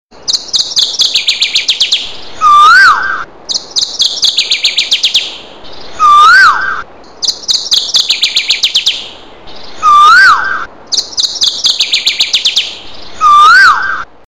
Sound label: birds singing